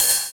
Ride; Equipped Music; Loungin House

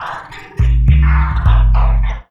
Metals Bumpers; Sonic Foundry (Sony Creative Software); Esoterik Beatz

